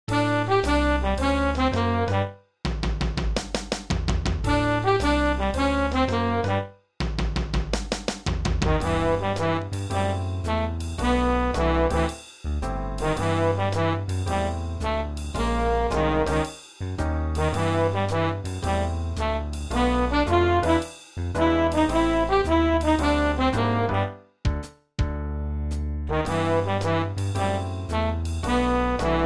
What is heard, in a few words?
Jazz Band; Orlando